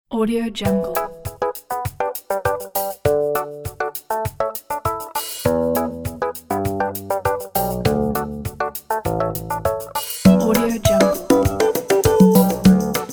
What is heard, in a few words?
happy funk; lemurmusic